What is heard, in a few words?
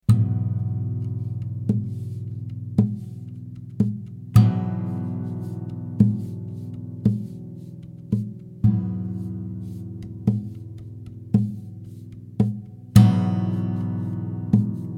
E 112 GTR percussive dry